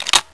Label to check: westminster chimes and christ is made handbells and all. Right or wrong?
Wrong